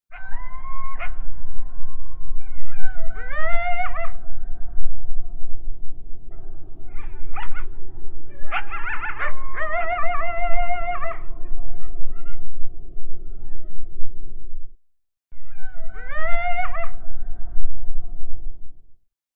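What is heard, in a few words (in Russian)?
койоты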